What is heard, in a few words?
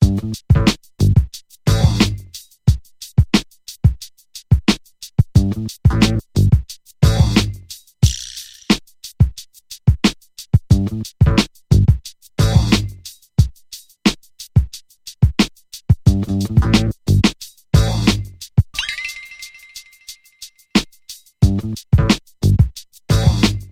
beat